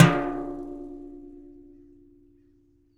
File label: hit, rust kit; Nucleus Soundlab; Ambient Drums